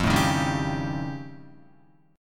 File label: F Augmented 9th F Guitar, chord; F+9 strummed; JGuitar